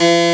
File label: Harpsichord Ab1